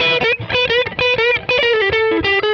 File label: Lead Boogie E; Loops